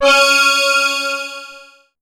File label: C#, BELL GONG, Synclav FM; Big Fish Audio